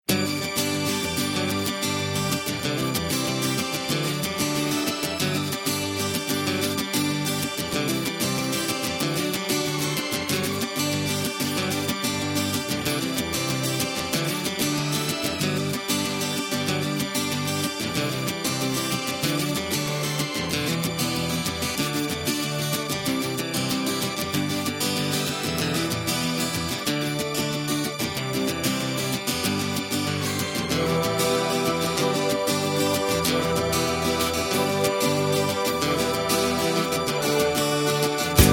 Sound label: No Backing Vocals, (G)